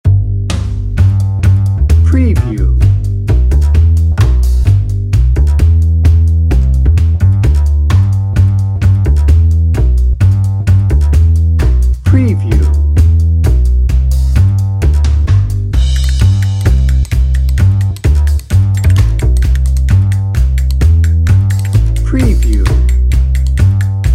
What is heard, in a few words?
bluegrass dnb